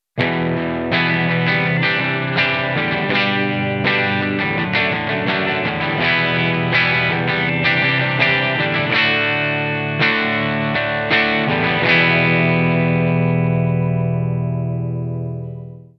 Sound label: Maxon ST9Pro+ bypass